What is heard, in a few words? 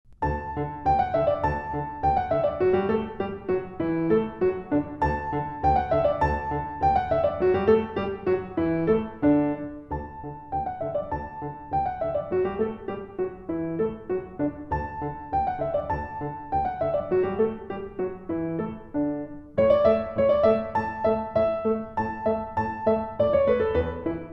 Musette